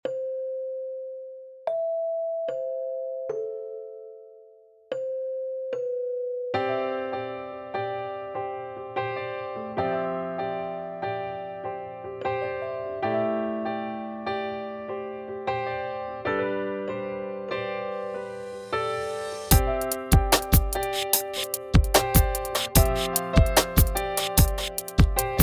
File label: Pop Track